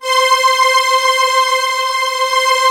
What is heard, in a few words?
OCT.STR. C4, YAMAHA, Analog Fantasies; USB Soundscan vol.49; AKAI